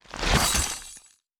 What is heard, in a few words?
Frostbolt Impact